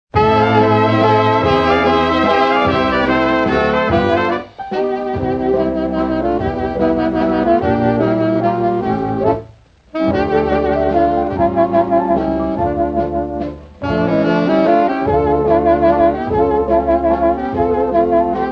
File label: waltz